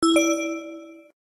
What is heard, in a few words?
super button; super color lines